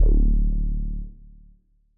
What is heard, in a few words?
M Elec Bass D1; KG MegaSaur